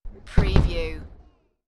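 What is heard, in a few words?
Artillery Two Shot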